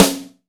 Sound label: Natural RimShot, snares